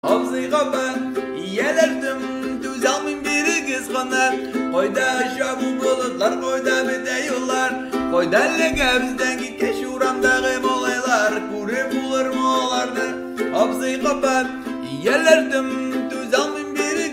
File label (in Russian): na ukulele; Tatarskaya